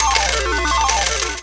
JACKPOT

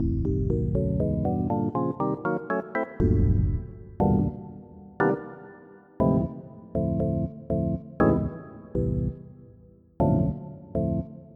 Organ Hit; Onj's Soundfont

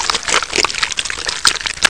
Flesh Goop

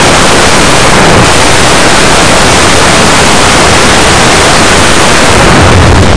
gear

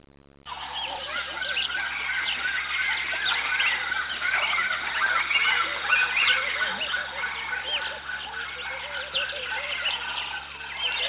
midday, forest; ambient

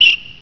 SHOE

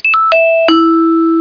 Vibes

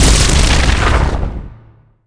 impact resist

